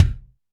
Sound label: DM5 Kick, Alesis DM5 drum machine